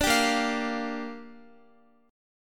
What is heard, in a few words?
A sharp 6th, Guitar; A#6; strummed; x,x,12,12,10,12